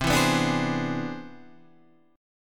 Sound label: C Augmented Major 9th; strummed; JGuitar